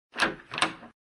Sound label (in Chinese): 开门声